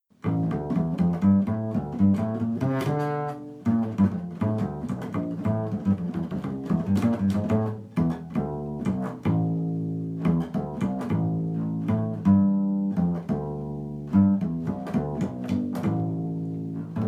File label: solo